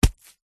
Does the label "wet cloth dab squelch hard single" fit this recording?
Yes